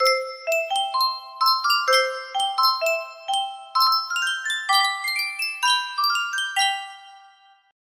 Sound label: miniature music box; sankyo